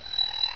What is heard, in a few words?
houndeye idle